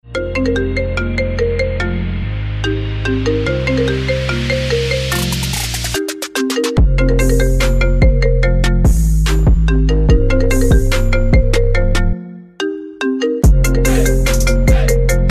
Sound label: drill beat remix; iPhone